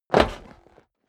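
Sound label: step boots metal dry jump, footsteps; schritte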